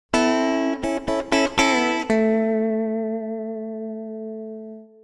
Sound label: Tele Lick; KENROW